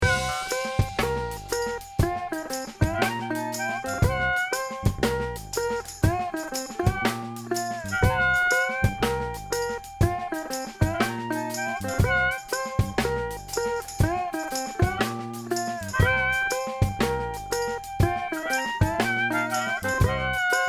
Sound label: Blues